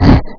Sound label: Yeti Grunt; Playable Tutorial Level; TR2